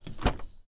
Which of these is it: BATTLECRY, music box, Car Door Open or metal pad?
Car Door Open